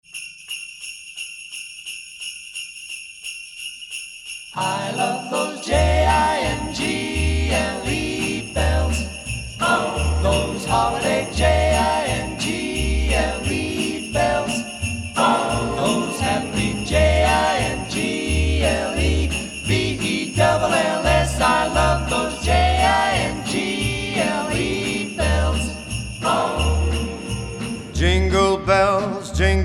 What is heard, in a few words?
Jingle Bells